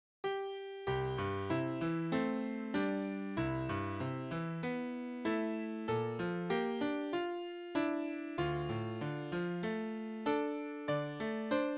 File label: easy piano; Holiday Hymn Preludes